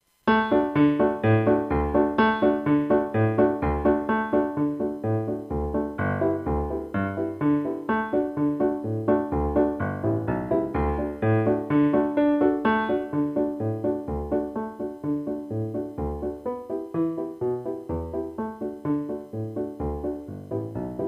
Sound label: acc; Ching a ring chaw